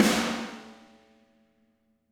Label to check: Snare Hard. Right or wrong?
Right